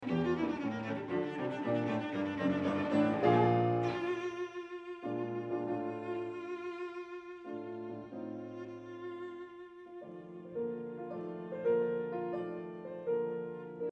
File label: arpeggione